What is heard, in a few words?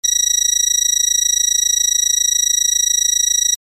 Scanner Pulsing Very, drones